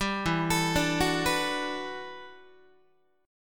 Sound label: E Minor 11th, Guitar; Em11 arpeggiated; 10,12,12,12,10,10; JGuitar